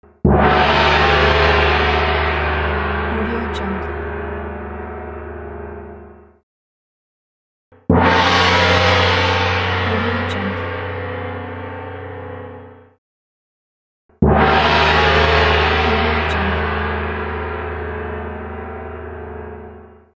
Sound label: gong sounds